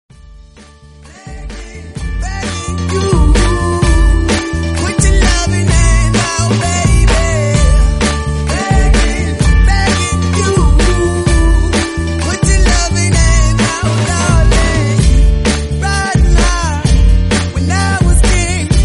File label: Slowed Remix English Song Ringtone